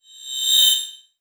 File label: Multimedia Internet CD-Rom Flash High Pitch